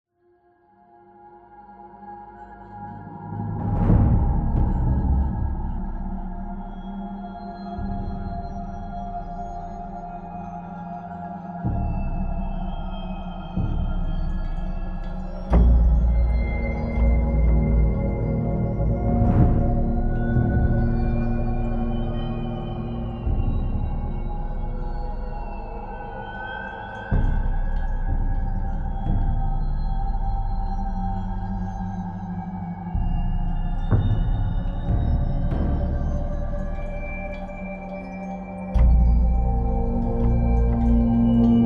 Loop Tense

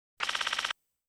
Shutter

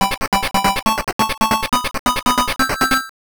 Level Start; Slider Game Gear

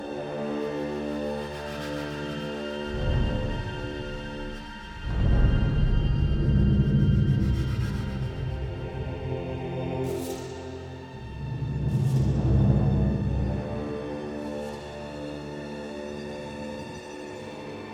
Suspense Loop

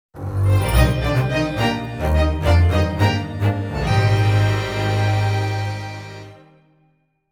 Jingle Intermission

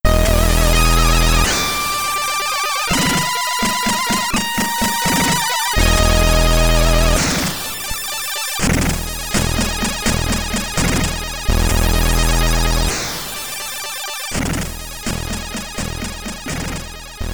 Stage Intro SID Stereo; Commodore 64

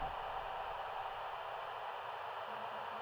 Ambient Weather; Among Us

